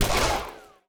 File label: Gun Shot; netent